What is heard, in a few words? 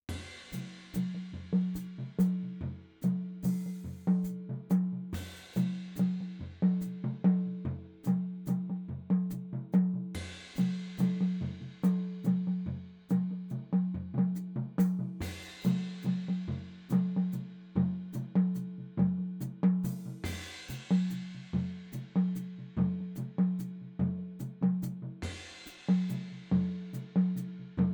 strange home snare; film